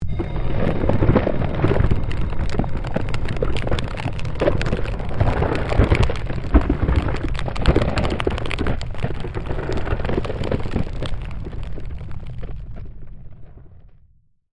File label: Created Magic Spell Glacier Quake